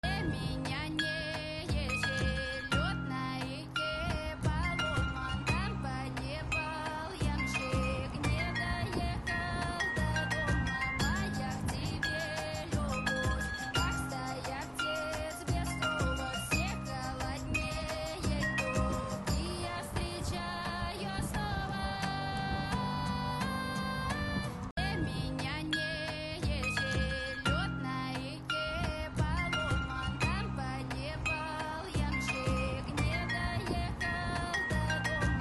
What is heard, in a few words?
cover butylofon